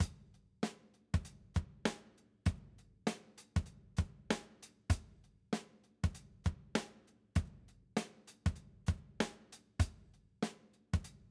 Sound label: beats